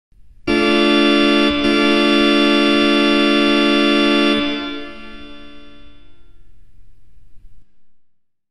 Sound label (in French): orgue